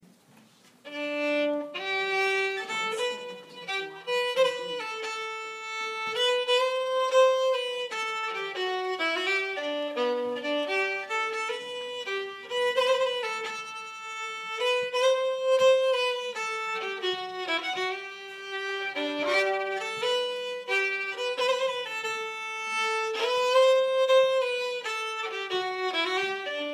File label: Polska; Polska efter Zouaw